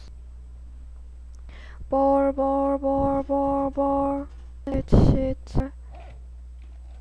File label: bowl